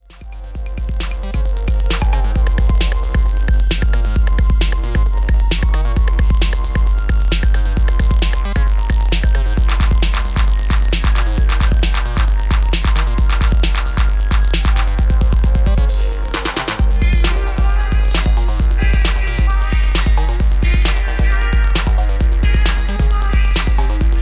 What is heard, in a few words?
trance